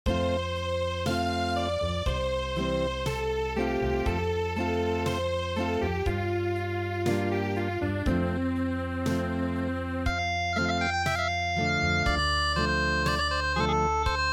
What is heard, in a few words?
Unplugged, midi